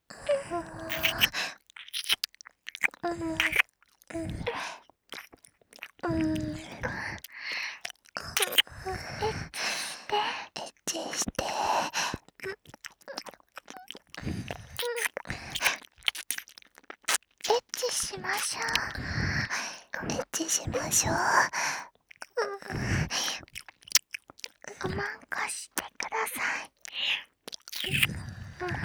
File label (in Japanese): 耳舐め 喘ぎ; エッチしよ; ハーレムオナサポ 4人のメイドがご主人様のオナニーをお手伝いするバイノーラル～文、千曜、名月、莉桜～